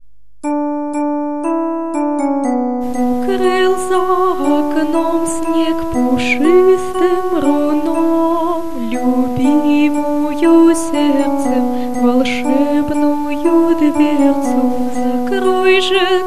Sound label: lullaby